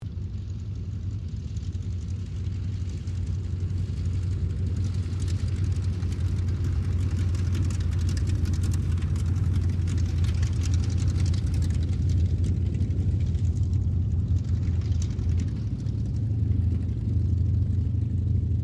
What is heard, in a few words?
AIRCRAFT CERES WINJEEL WIRRAWAY PREFLIGHT DISTANT; Mixed aircraft squadrons; Airplane; Vehicles; Aircraft Collection